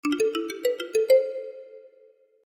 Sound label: island ringer ringtone